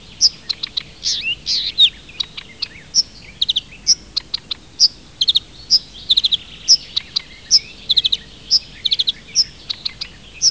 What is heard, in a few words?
Acrocephalus dumetorum; Камышевка садовая